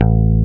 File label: A1 PICK BASS